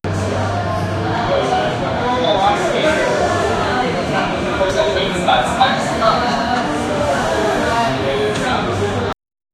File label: bar sound